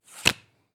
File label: Playing Card Deal